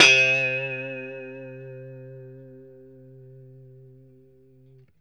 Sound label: POP C 3, STRAT, Guitars; Spectrasonics; Hans Zimmer Guitars VOL-1; JC-STRAT POP